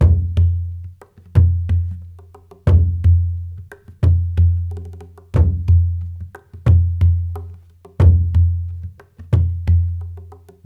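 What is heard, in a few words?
90 bpm, Nyabinghi; Spectrasonics; Supreme Beats; African Contemporary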